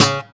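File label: GUI PULL, acoustic guitar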